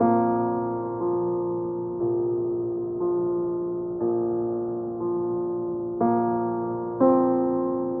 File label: piano, Sad